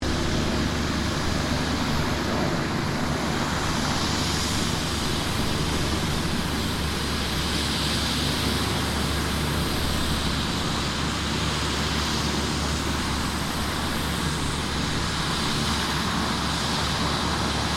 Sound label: HELICOPTER SIKORSKY S76 RESCUE IDLE, S-76 Rescue Helicopter; RAAF; Helicopters (Sound Effects:Vehicles)